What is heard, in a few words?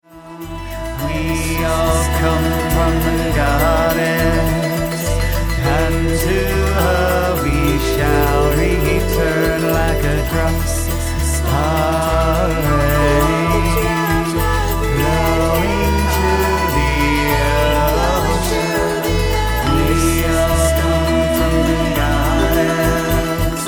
Goddess Chant